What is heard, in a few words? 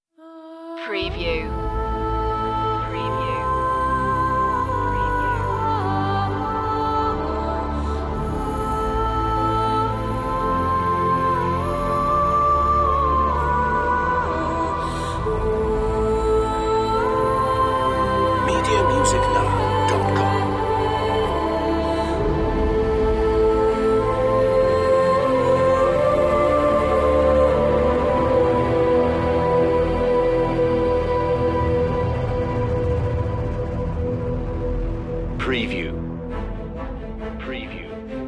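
no percussion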